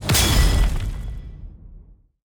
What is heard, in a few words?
gold spear set